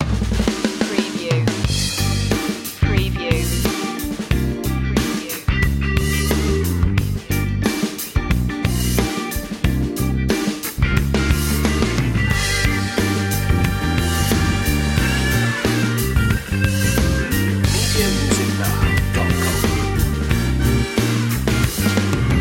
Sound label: blue jam